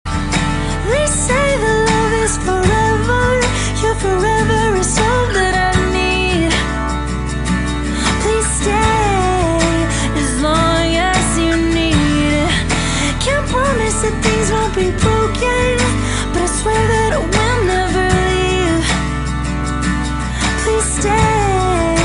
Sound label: acoustic